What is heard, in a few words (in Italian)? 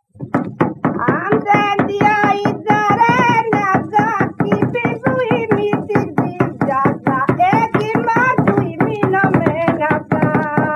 canto a tenore; archivio rai sardegna; musica strumentale